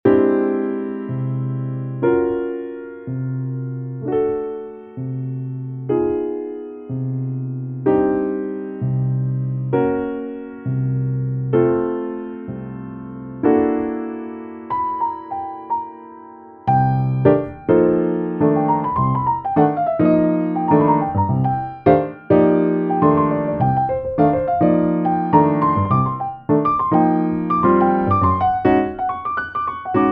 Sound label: defiant piano jazz jam